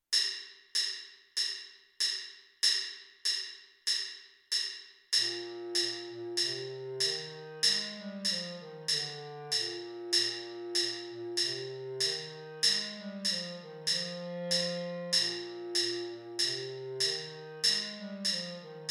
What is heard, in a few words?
bass clarinet